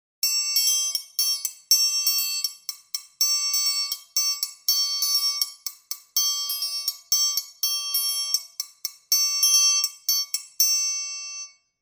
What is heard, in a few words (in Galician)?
ferrinhos